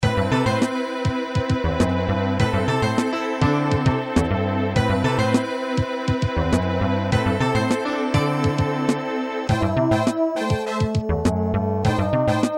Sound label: in a cave; Breath of Fire